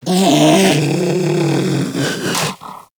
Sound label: predator vocal tranquillized; Warframe; Hunting Critters; Characters